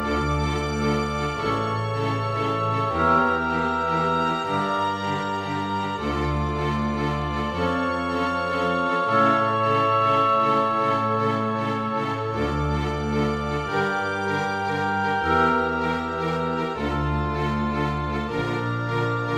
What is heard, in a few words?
woodwinds and strings